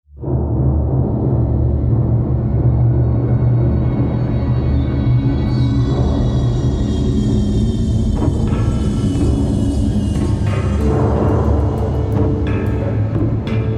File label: Tense (Loop); The Walking Dead: The Telltale Series